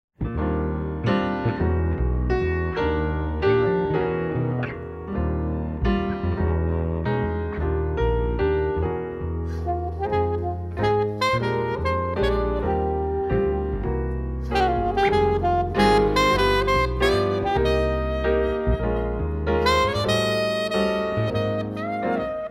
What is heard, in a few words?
Waltz